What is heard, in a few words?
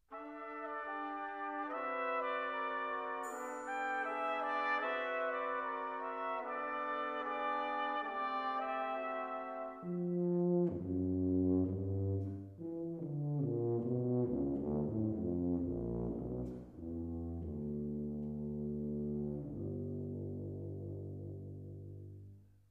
brass